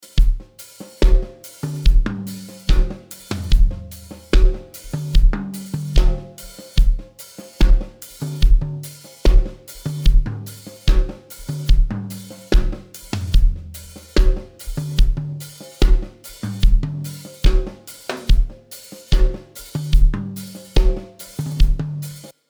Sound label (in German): Summe mit Soft Sat Character